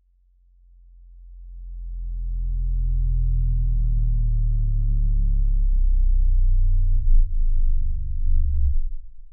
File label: low frequency bass riser